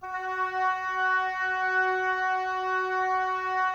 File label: OBOES F#, Woodwind Brass Ensemble; Miroslav Vitous